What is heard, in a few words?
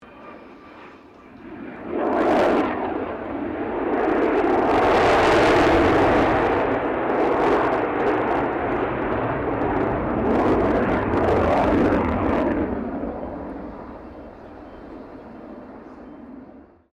AIRCRAFT FA-18 HORNET PASS DISTANT; RAAF FA-18 Hornet; Airplanes; Vehicles